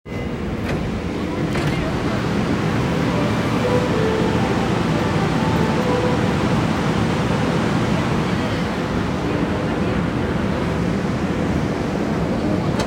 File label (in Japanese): 池袋線入間市東, train sounds; 西武